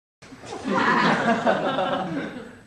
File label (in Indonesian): ketawa; bajuri